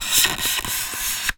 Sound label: Polystyrene Squeak